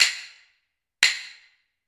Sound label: Hyoshigi fire; jidaigeki